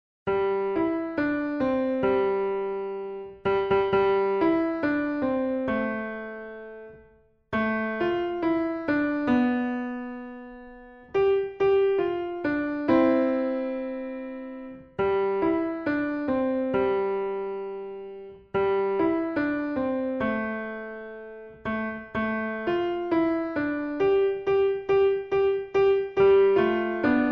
Jingle Bells

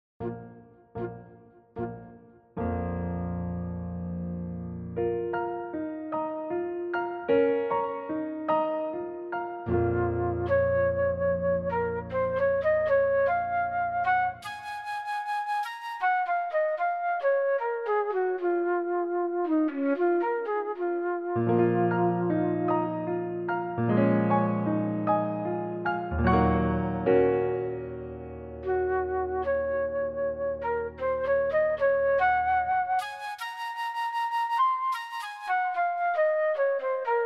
fast stereo; Uebungs-CDs; Playbacks

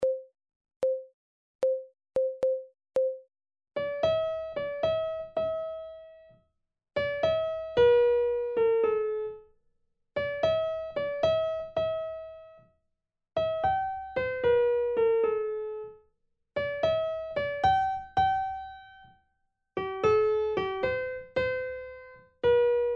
Slow Fox 75 Primo; Seiber

